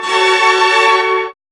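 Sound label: string chord; X-Static Goldmine 1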